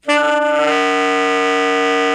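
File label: MULTIPHONIC, TENOR SAX; Northstar - New Gold VOL-1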